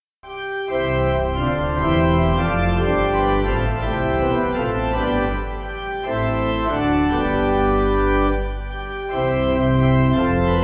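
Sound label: Pipe Organ, Backing Files for Churches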